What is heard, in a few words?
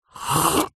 human drink tea coffee sip slurp